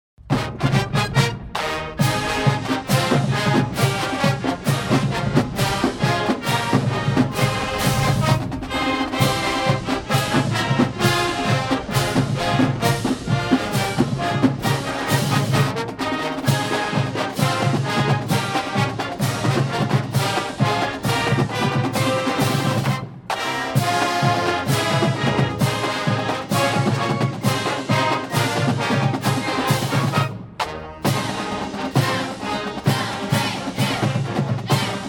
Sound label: Fight Song Percussion Version; LHS